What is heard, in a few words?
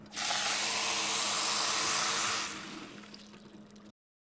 Cup Fill Household